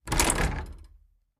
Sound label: Attic Closet Door Clo